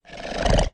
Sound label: Snort